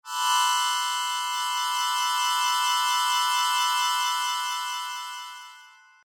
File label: Sho